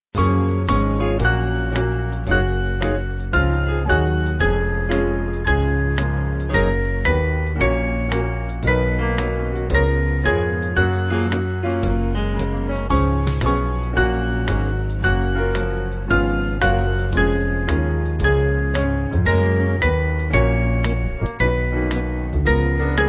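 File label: Band, Music Backing Files for Churches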